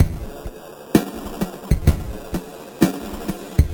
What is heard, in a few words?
VFX2 Loop 128 BPM